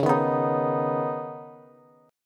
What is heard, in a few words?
Db 7th Suspended 2nd, Bass, Db7sus2 chord; JGuitar